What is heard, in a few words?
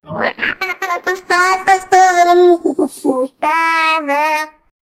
scrubbing sculpture